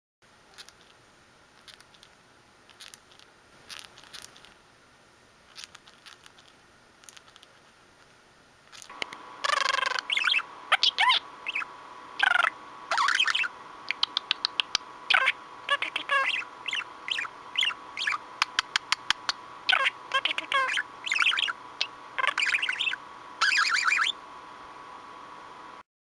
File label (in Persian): صدای شکستن پوست تخمه تق تق